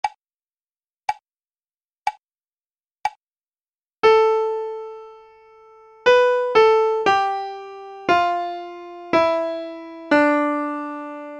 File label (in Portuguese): imitacao melodica; Processo Seletivo UFAL 2018 - Curso de Licenciatura em Musica